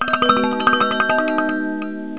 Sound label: TOY CHIME